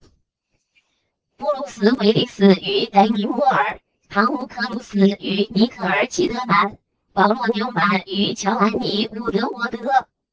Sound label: Sinewave